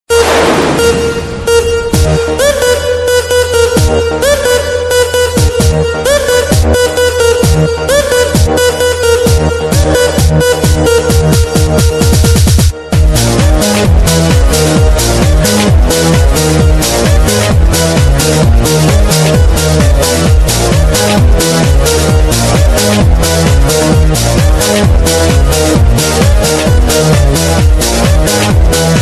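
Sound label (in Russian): klubnaya muzika, club music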